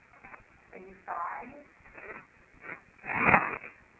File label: im sighing